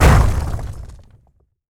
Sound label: Some hitting effect; Kilokem; Defensaria